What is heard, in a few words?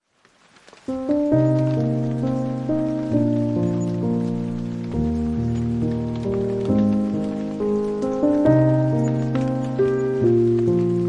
Piano, With Rain